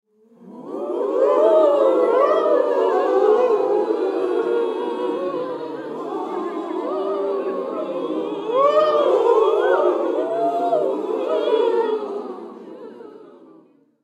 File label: Human Crowd Studio; Crowd Reactions Ghost Moan; Sound Effects:Voice